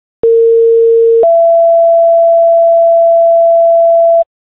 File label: siren, tones